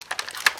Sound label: idle rattle; creatures organic